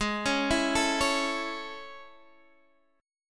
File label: A Major 7th G sharp